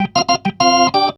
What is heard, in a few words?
B3 LICK F; B3 PLAYED